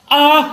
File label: auughh